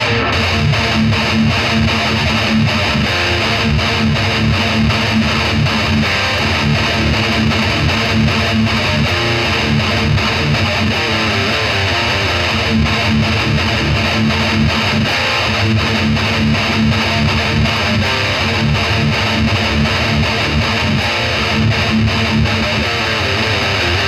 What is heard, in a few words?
METAL